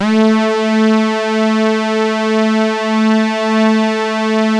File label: GLIDE, Synth; InVision Interactive; Keith Emerson; The Most Dangerous Synth and Organ